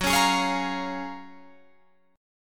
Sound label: Gb Major 9th, down, Guitar, Drop D